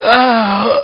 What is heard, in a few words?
DYING; BattleSNDS